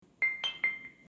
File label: glitter